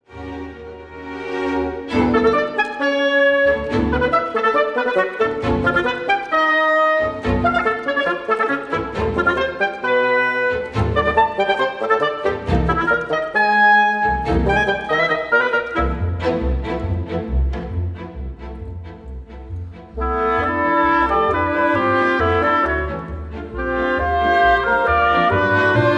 Concertino for reed trio and strings Allegro Frivolo